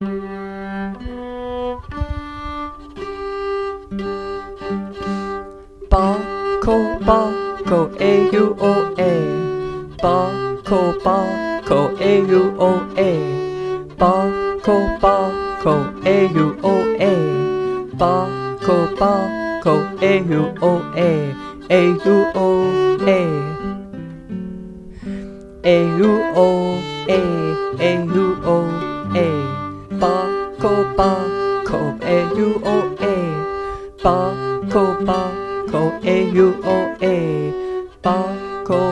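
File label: Alto Words; Bacco Bacco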